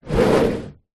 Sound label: plastic tub slide on top of another; zapsplat